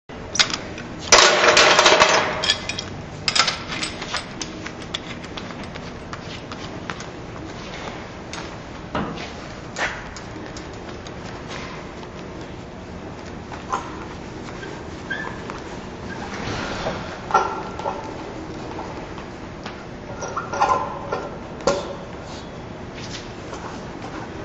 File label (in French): Chalumeau